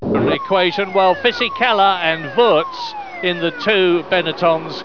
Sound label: woowoo